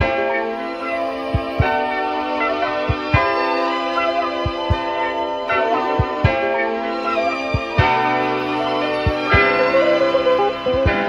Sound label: guitar strings, loops; Phonky